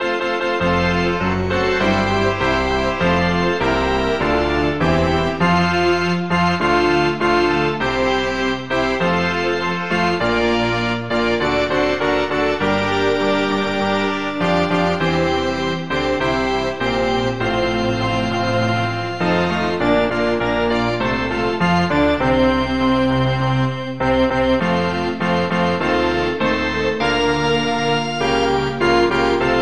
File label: Victory song Music